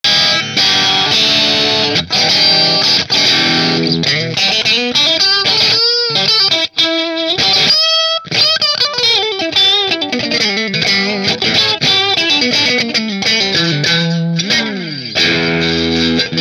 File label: middle marshall; 2002 Music Man JP-6 Mystic Dream